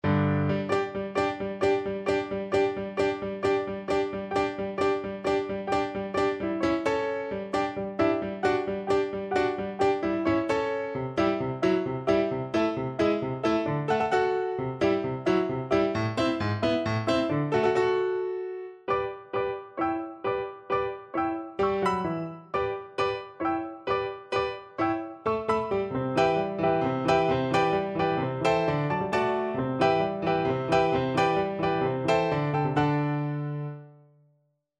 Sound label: Viola